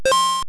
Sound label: Coin; Super Mario Land